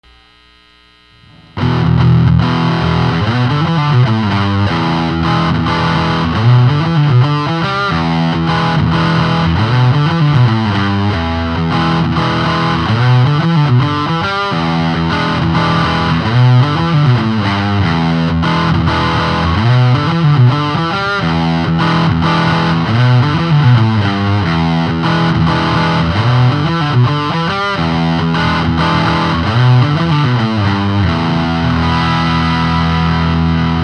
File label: el34 altec